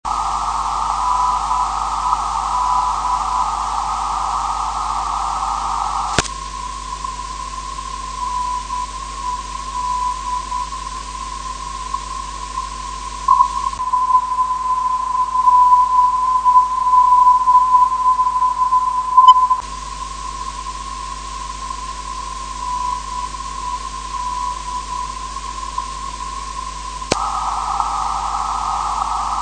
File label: 465khz